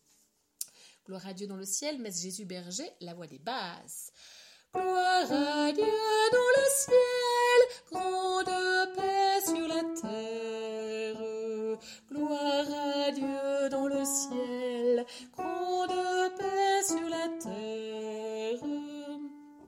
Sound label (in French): Groire a Dieu messe berger basse